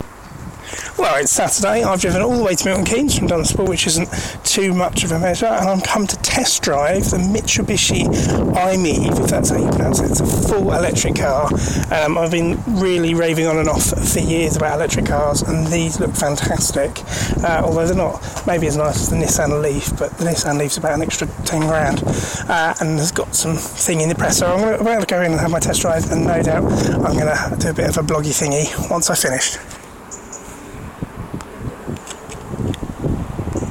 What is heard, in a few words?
test drive electric car